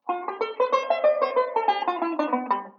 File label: Mixed Scale, banjo; Beyond Chord Melody Volume II